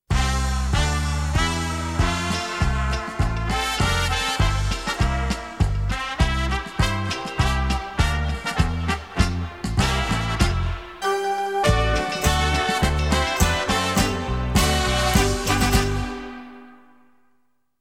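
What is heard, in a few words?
retro style sound transition dynamic joy of dance; klassicheskij film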